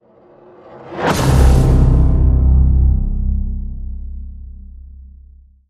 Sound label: Hit Tonal Execute